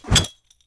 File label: grenade throw; csof